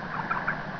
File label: gooselet chirps ywywyk, Anser